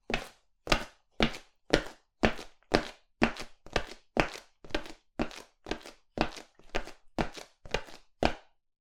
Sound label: Footsteps Male - Boots Lino Regular